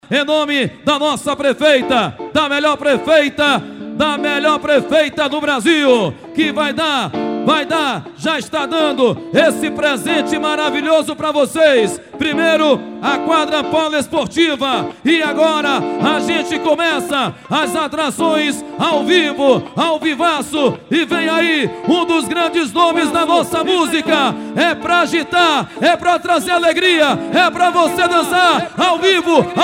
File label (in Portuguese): reggae; torres som